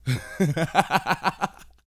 LAUGH, Vocal Jazz Fx

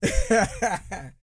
LAUGH, Vocal Jazz Fx

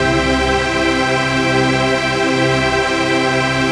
RAVE PAD; Best Service; XX Large; Pads

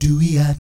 DOOEEOT, Vocal Jazz Fx

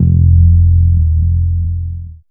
BAS TON; E-Lab; X Static Goldmine 2; akai

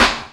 SNARE; E-Lab; X Static Goldmine 2; akai

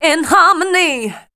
voice spectral, ly short

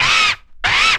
HIT; E-Lab; X Static Goldmine 2; akai

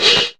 O HAT; MLOP DRUM; E-Lab; X Static Goldmine 2; akai

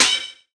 SNARE; E-Lab; X Static Goldmine 2; akai

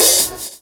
OH TONE; E-Lab; X Static Goldmine 2; akai

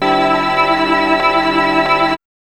CHORD

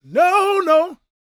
GOSP MALE, Vocal Planet Gospel

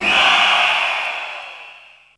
VOX; ZERO-G; Datafile

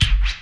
SCRAT; E-Lab; X Static Goldmine 2; akai

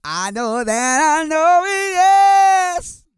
GOSPEL

